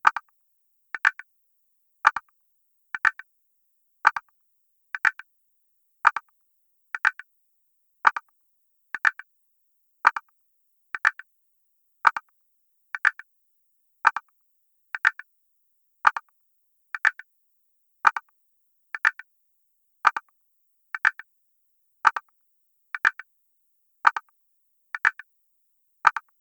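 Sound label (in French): tic tac d'une grosse horloge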